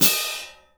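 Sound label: cymbal, Orchestral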